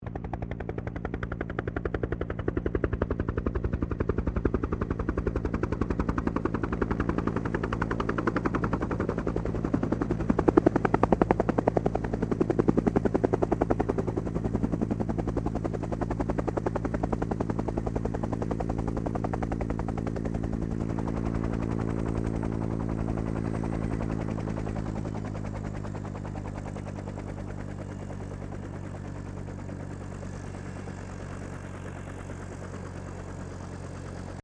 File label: HELICOPTER BELL UH1 IROQUIOS LAND; RAN UH-1 Iroguios; Helicopters (Sound Effects:Vehicles)